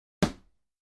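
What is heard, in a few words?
Boxing Single Bag Hit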